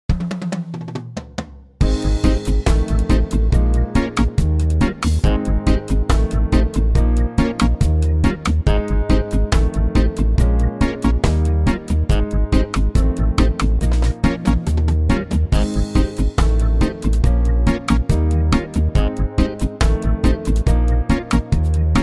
Ab

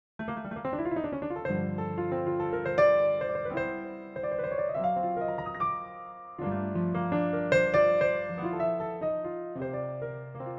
trio; key - F to Gb - vocal range - A to Db; TRIO arrangement

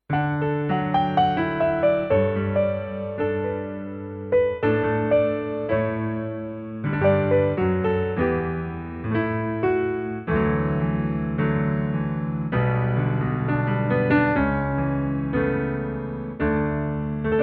D piano

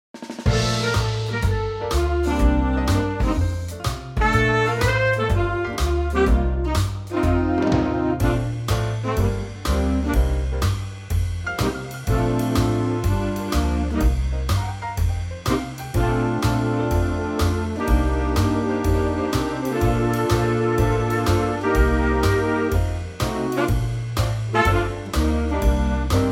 big band; key - Bb; A superbly swinging Big Band arrangement; makes a great foxtrot!

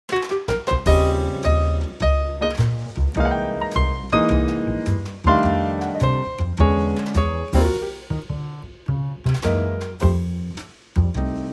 trio Eb

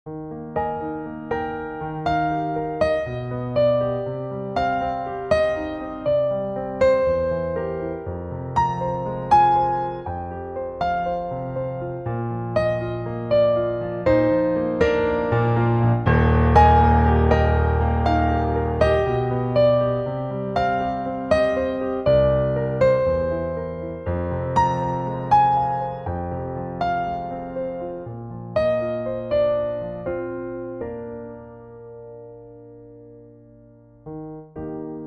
Eb piano; Pianotrak; key - Eb - vocal range - F to Bb; Updated 2024 piano only arrangement

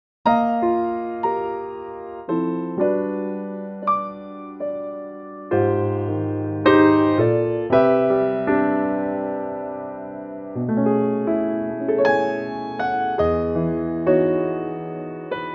Eb piano

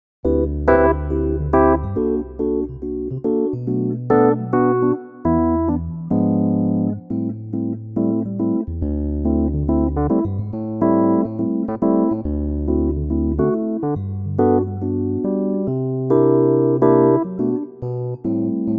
electric piano G; key - G - vocal range - B to D (optional E at the end tag); Gorgeous electric piano arrangement of this classic standard

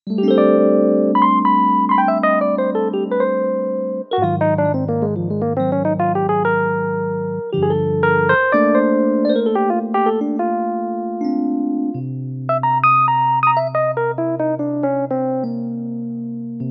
Rhodes F

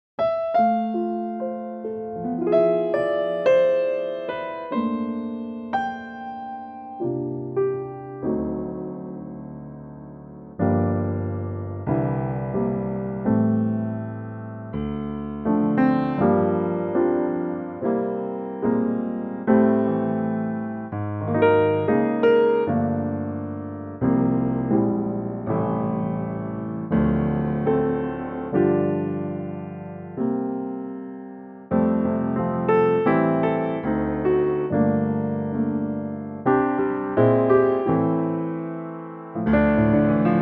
F piano; Pianotrak; key - F - vocal range - G to G (optional A)

